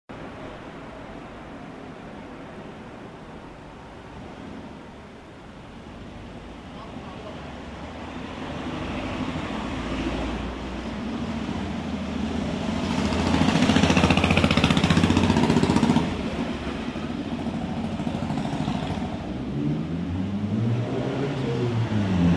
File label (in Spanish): calles; Rosario